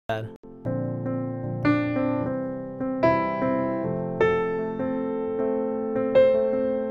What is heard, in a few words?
One note solo, piano